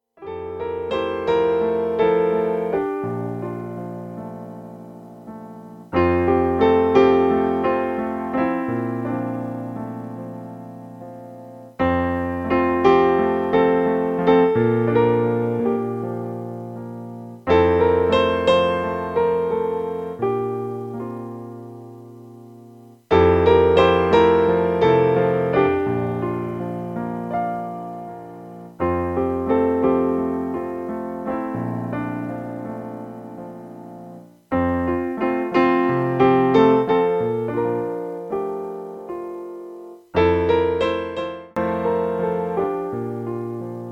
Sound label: carillon